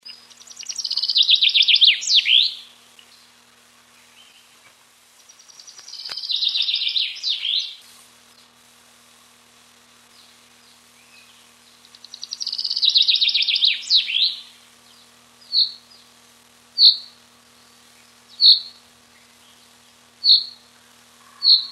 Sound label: fcoelebs, birdsong